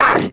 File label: dorian hurt; Crypt of the NecroDancer